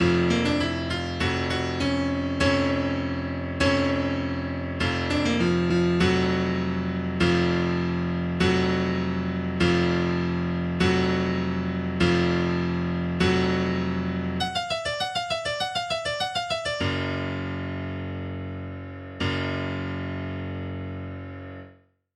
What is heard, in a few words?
Ominous Music